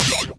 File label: Synth, DnB One Shots